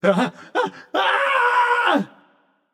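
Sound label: nonverbal fear; smite; voicepack; merlin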